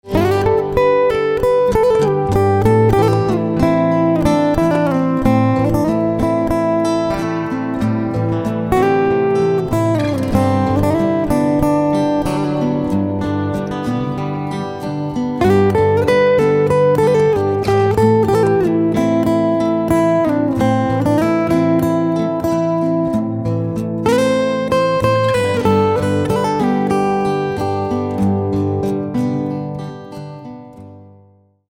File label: Guitar